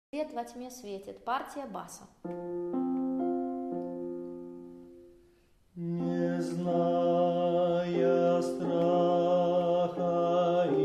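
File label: bass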